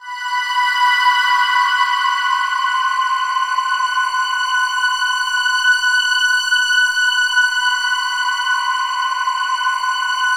WS GHOST; Synclavier Sampler Library; KEYS AND GUITARS

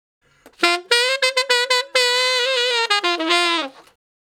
Ten Sax Straight (F), R&B; Crimson Blue and Fabulous Horncraft 4 RnB; Solos

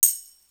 Tambourine; Coldcut's Kleptomania VOL1

